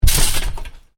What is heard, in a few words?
Trampoline bounce heavy, Trampoline, bounce on: heavy bounce on canvas trampoline; Miscellaneous Sport Sound Effects; Stuart Duffield